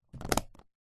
foley luggage briefcase old leather metal latches click lock silmulatneous; zapsplat